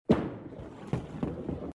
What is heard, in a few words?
bowl; tai lieu tieng anh 7 right on